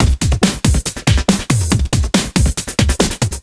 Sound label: Breakbeat 140 BPM; Vengeance Packs; vengeance essential club sounds-3; VEC3 Loops 140 BPM; VEC3 Breakbeats 140 BPM